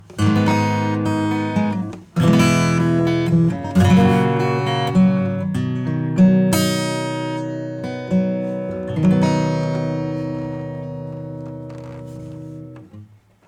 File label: acoustic